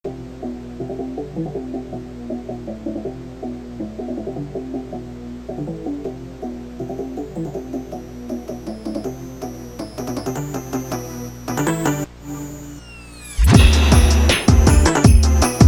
"fart" phonk track

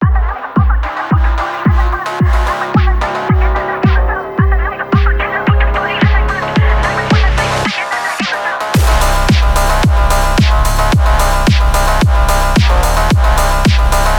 gaming phonk cyberpunk

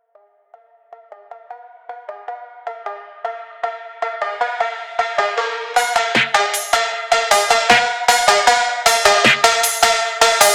night phonk

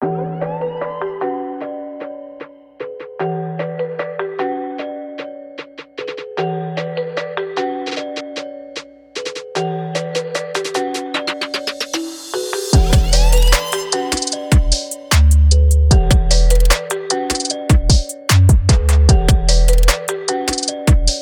trap beat

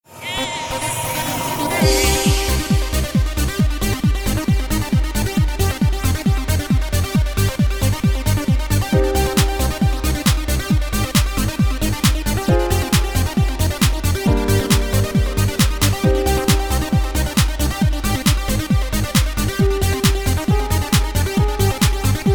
basic dance

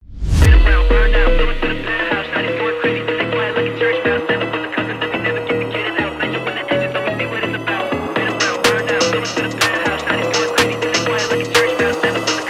phonk

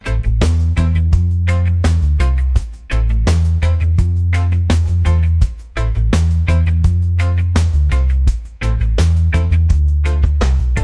reggae

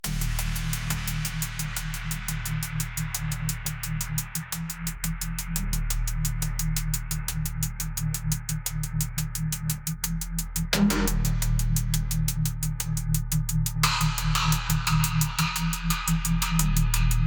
neuro overload bass

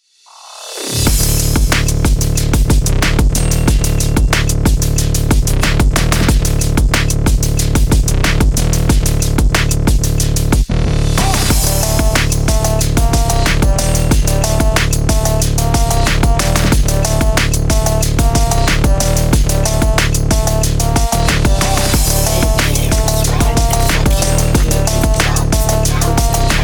burning fight phonk hard trap

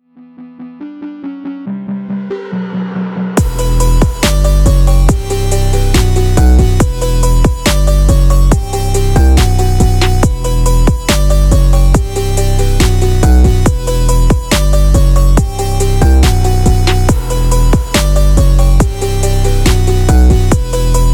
drift phonk phonk

